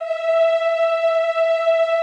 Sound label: EEH E4, Classical Choir; Best Service; Extended Classical Choir